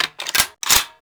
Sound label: reload sniper; weapons; Max Payne